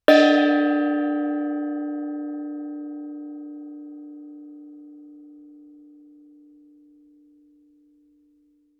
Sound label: thai gong ff D4; MIS Pitches - 2014; Percussion